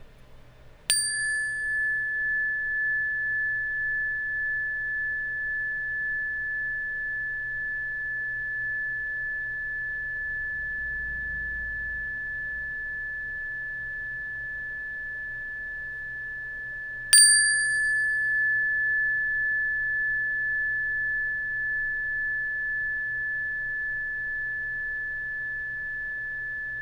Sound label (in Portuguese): Pin La, instrumentos de quartzo; escutar instrumentos de quartzo